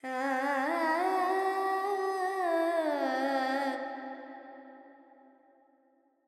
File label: Carnatic Female Alaps, Panthuvarali, 100 G, Verb